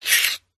game leather skinning sound fantasy material action movement texture; ancient game